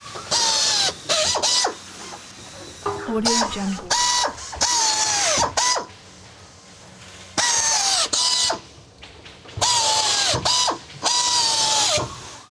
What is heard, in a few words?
air drill bursts workers